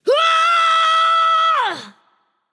battle cry; cerberus

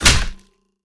melee impact; smite; odin

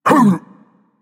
grunt hit; erlangshen

